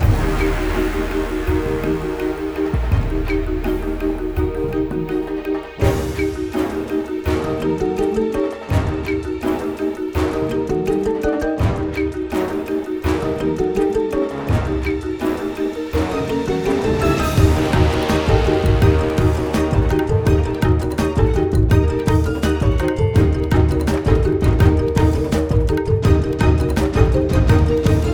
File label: Battle Loop